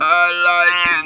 Talk Box

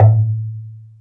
KALIMBA; Best Service Advanced Media Trax Modern Composer

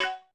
Dance Kit, Korg 01W

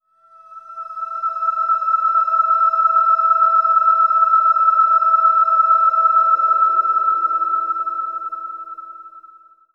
filmscore, E, ambient; Trance Formation

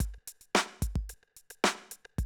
110, Classic Drum Loops

